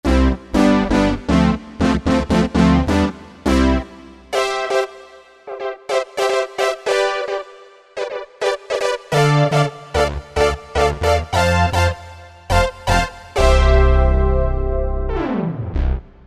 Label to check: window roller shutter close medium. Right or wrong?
Wrong